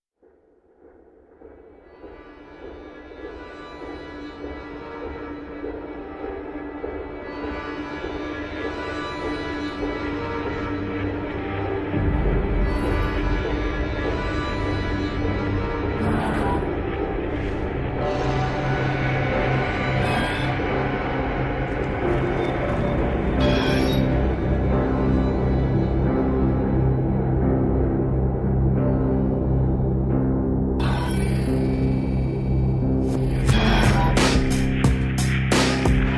Three Voices

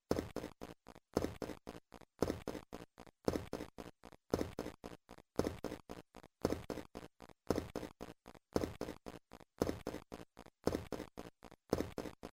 Footsteps

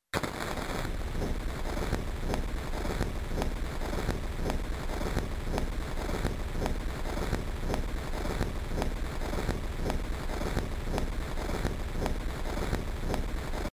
Fire

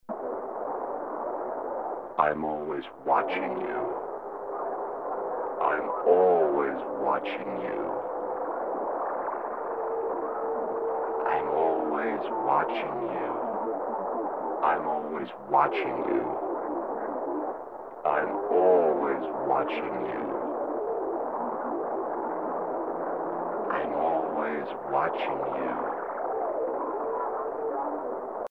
Room Cutscene Radio Haunting

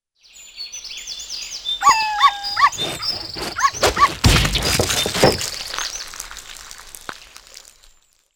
Dog Kill Cat

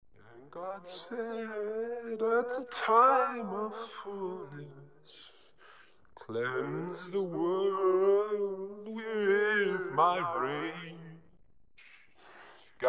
Singing Ghost

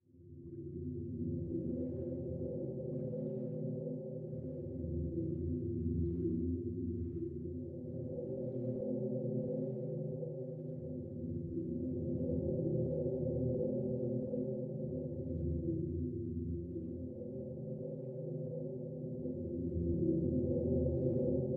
shipping dock music no sfx

